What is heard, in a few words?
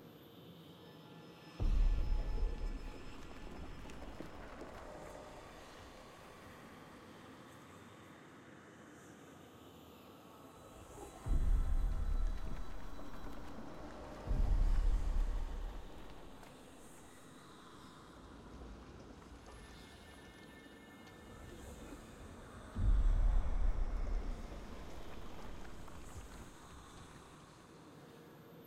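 ambience demacia complex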